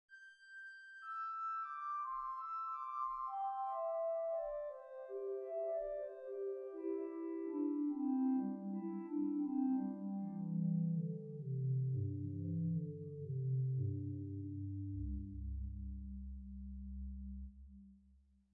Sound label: Gt Bourdon, hauptwerk